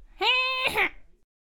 Dragon Carrier Attack Vocals

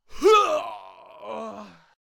Shadow Walker Death Vocals

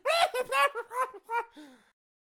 Mad Mechanic Attack Vocals

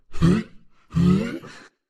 Thor Death Vocals

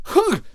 Catapult Death Vocals

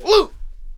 Monk Attack Vocals

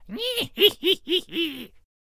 Scarecrow Attack Vocals

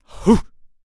Protector Attack Vocals

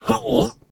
Death Vocals

